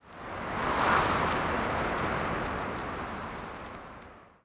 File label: wind TREE; The Elder Scrolls III - Morrowind; Morrowind GOTY GOG